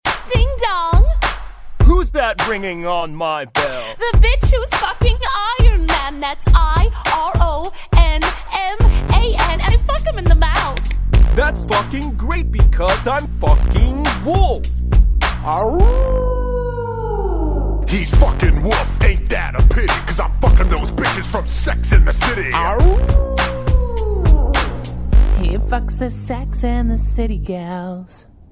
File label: rap; disaster movie